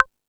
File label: Rap Session, Drum Machines E-MU Pro-Cussion